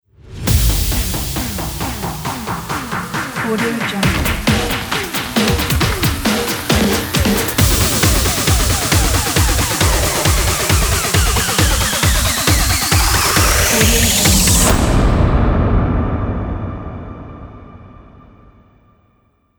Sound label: build up storm audio logo